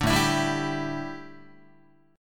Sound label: A 7th Suspended 4th, A, down, Guitar